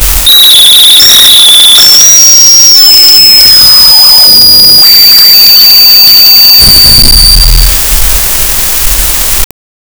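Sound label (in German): schreiender Falke mit Echo; falcon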